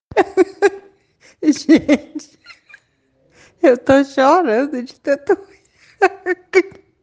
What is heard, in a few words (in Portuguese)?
rindo